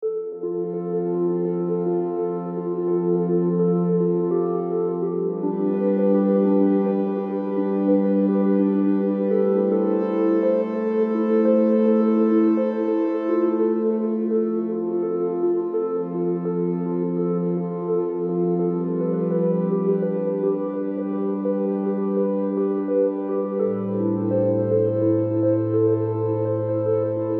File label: ANALOG Creamy vibes